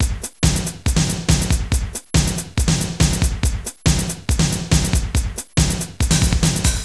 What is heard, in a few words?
80s breakbeat